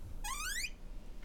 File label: High Squeak, Door; FXHome; Squeaking